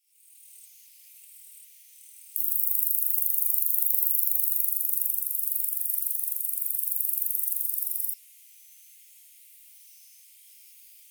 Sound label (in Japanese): usuiro